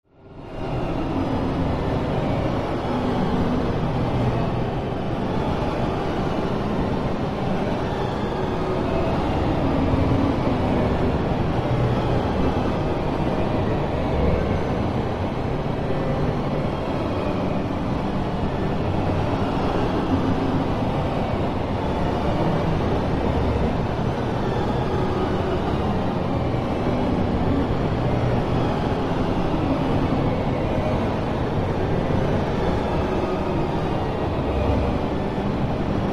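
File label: Music: Eerie Crazy Horror Strings, Frantic; orchestral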